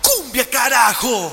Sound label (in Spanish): Cumbia